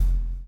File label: CHAIN; Spectrasonics - Backbeat